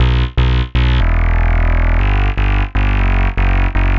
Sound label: Racey Bass, Bass Foundations; Sonic Foundry (Sony Creative Software); The Electronic Music Manuscript Collection